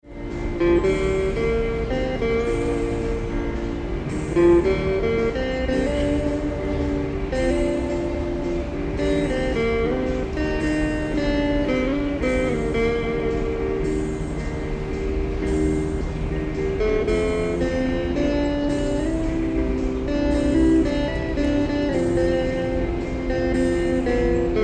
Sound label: busker in sofia